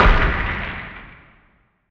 asteroid damage oxide big; Factorio

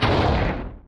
thruster engine deactivate; platform thruster; Factorio

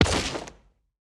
fly impact; enemies; Factorio; strafer

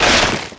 mined lichen tree, mining; ashland; Factorio

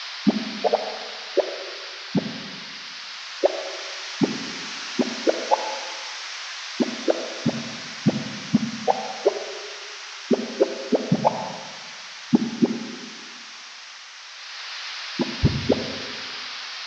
sulfuric acid geyser; Factorio

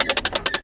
gears, cryogenic plant; Factorio

